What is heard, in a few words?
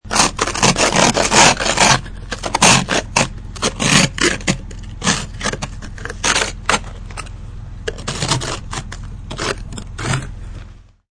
Scraping hardened ice off of a car windshield; Scrape Sound Effects; Objects